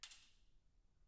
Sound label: hall impulse; 講堂3